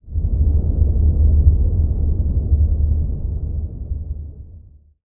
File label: Sound Scary Pit